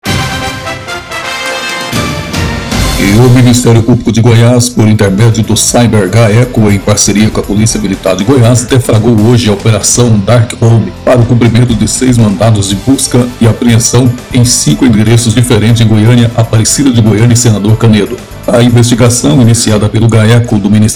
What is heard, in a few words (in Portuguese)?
VINHETA GOLPE; Gaeco desarticula grupo que aplicava golpe pelo WhatsApp